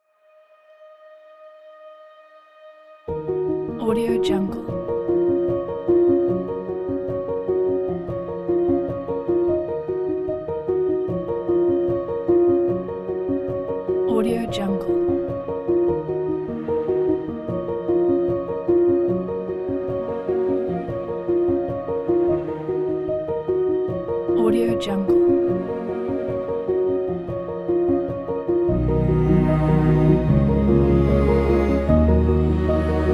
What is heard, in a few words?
cinematic beauty; tiktok